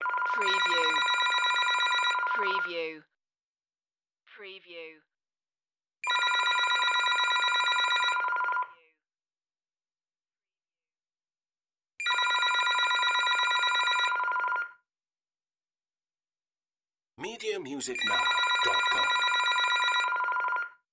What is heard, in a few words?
Telephone Ring; Sounds of ringing telephones, cell phones, mobiles ringing.